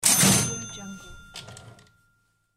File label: cash register chaching